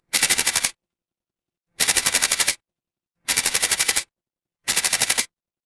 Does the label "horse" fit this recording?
No